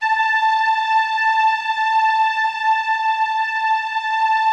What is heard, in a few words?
A4, STRINGS; Yamaha VP-1; Music Store; JODYSTRINGS